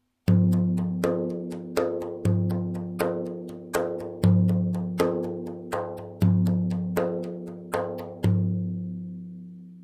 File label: tar, frame drum; notations